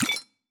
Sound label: Potion Take